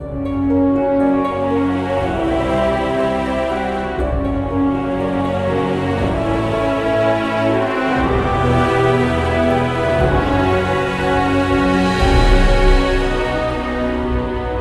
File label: Healing End; City Of Light; prince of persia 2008 gamerip